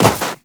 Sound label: Run Snow, footsteps; Warframe; Tenno